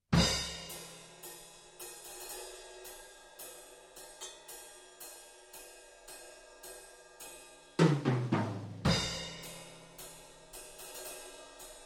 Drumming Full Kit Rock Practise; Over 5 mins of various drumming

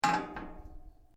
METAL ON METAL; Metal (Sound Effects:Materials)

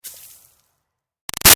Snow Dust Drop Light; Weather; Big Room Sound

